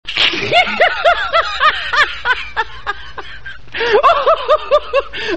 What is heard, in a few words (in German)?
lachen; cstrike